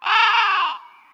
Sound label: Crow; Crow Pattern V O